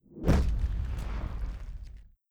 tom fall

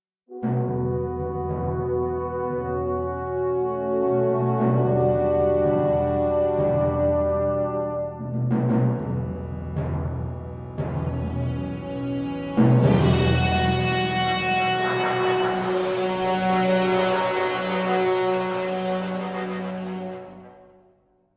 Factions Cinematic; Banner Saga 1 2 gamerip 2016

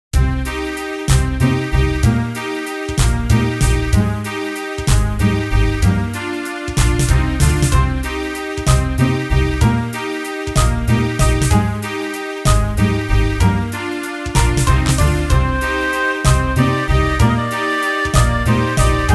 Waltz; energy breaker game sound legend series

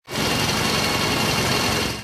Drill Hit

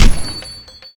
KORD

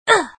Damaged; Tails; Tails Nightmare 3 Demo

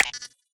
keypad fail; Poppy Playtime

